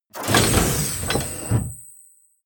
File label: sci-fi plasma cannon load